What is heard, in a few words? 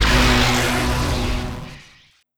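Laser Fire Interior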